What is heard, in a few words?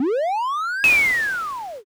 beam cannon